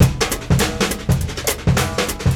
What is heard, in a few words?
BRUSH HOUR 102, OFFMIKE DRUMS